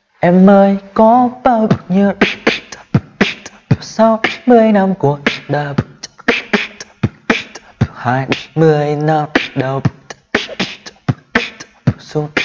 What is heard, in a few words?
beatbox